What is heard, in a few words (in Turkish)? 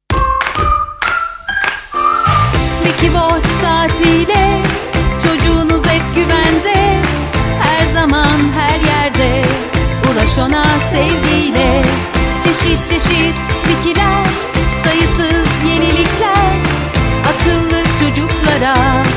vokal jingle; wikiwatch